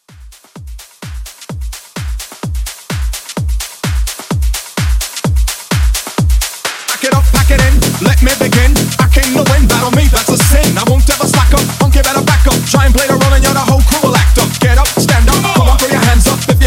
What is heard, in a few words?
House Mashup 128